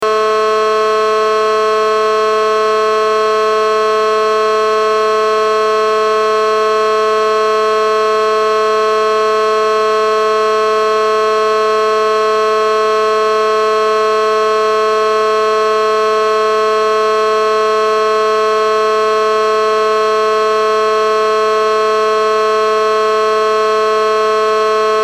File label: whelen horn